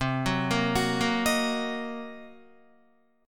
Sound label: C Minor 7th Flat 5th Guitar, chord; Cm7b5; arpeggiated